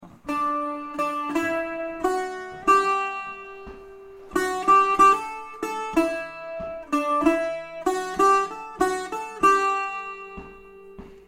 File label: varka mou melodie